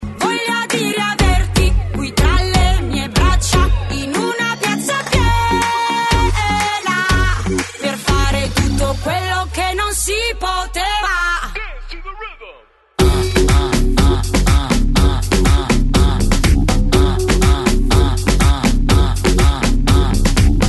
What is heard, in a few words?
karaoke